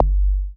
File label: BD ANALOG; Sound & Vision - Gigapack II CD 2